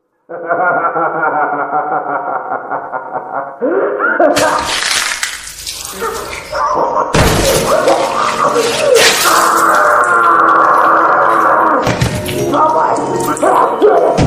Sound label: death sound; purple guy